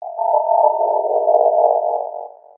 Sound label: scifi; Scars FNC SFX